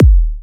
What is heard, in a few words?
Kick; United